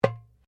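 Drum Darabuka Strike piano, Egyptian Darabuka Drum; Commanly available Egyptian Darabuka Drum; Musical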